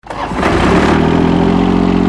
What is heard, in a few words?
generator starting